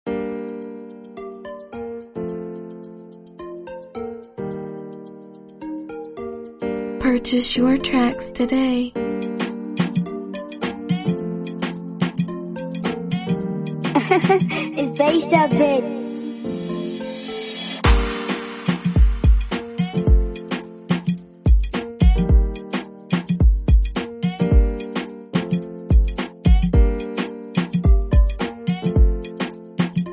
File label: Afrobeat Instrumental